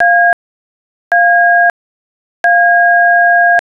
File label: keypad a, phone; SFX